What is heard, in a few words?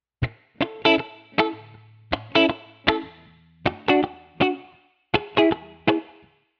guitar sound